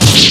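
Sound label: Battle Katana Critical; Etrian Odyssey III HD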